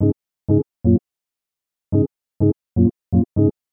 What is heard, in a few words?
Organ chops (125BPM), retro house